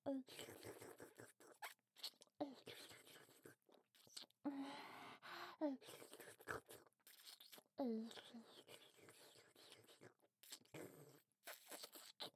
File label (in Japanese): れろれろ強め; 乳首舐め; 喘ぎ、耳舐めのみ